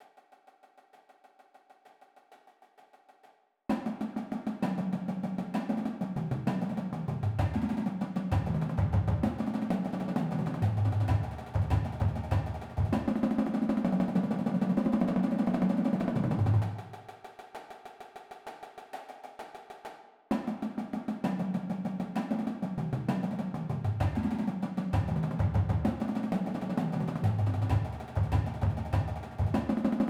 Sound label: Drags; diddles rolls